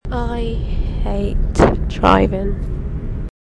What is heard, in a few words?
argh